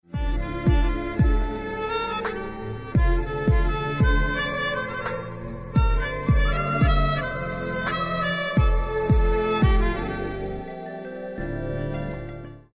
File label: heartbeat instrumental bgm